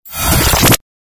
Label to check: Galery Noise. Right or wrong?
Right